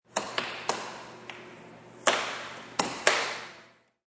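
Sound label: Air Hockey Short, Air hockey game; Part of Air Hockey (Sound Effects:Games)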